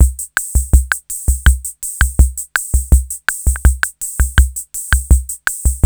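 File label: BOSSANOVA, drum machine; Crypton - DrumBase5000; TR-66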